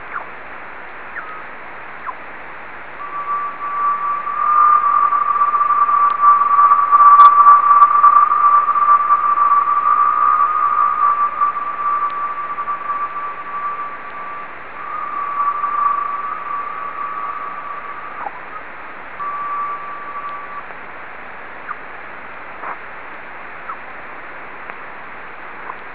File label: leonid sample, Meteors